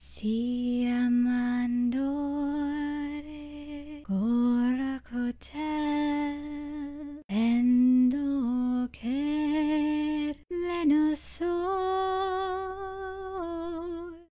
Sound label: Pilgrim Hornfly Sing